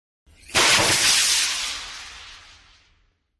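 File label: Solid Fuel Rocket Launch, small rockets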